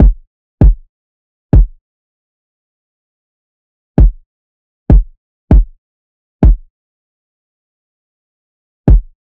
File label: Drum Loop - 98 BPM Kick; Loop Stems; Cymatics; Vibes II Drum Loop Collection; Trails